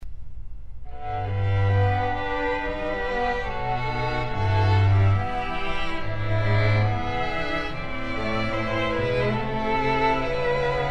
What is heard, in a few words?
Panis, strings